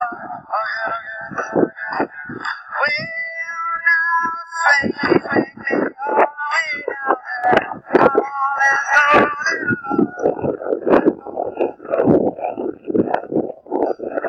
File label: sings along